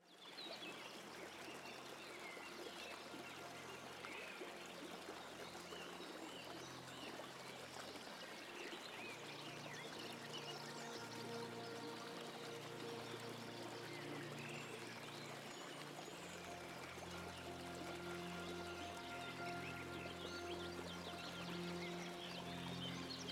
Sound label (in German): Naturgeraeusche